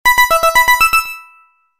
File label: Pinball tone